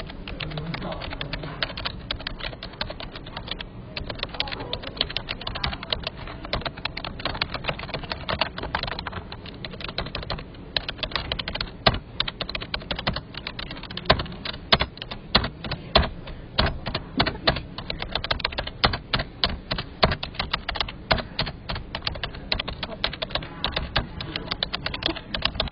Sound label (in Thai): คีบอร์ด